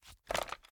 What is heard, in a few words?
ar reload empty xmag twist, weapons; viper